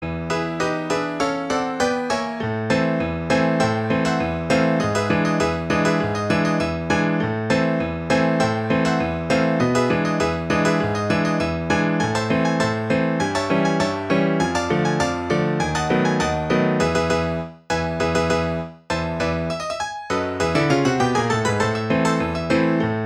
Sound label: Ragtime